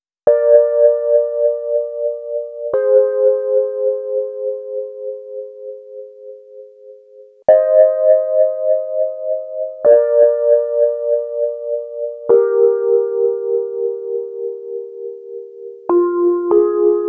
Light Leads, Electro Lounge; Sonic Foundry (Sony Creative Software); 100 Divide This

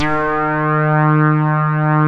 SYNTH LAYER; InVision Interactive; Keith Emerson; The Most Dangerous Synth and Organ

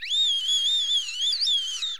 BIRD, bird call; Greytsounds VOL-1; BIRD CALL FX

